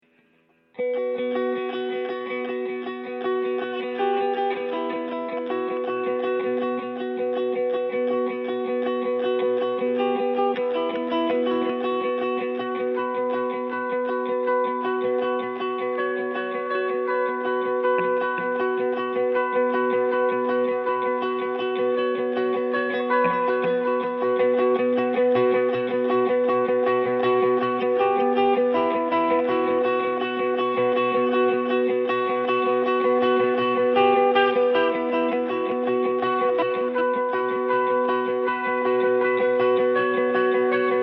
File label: electric guitar